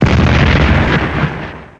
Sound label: putt bang; Re-Volt